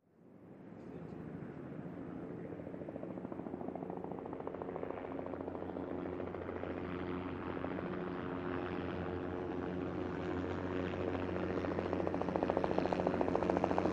Helicopter By, Jet Ranger, Long Approach & Away, Good Blade Whine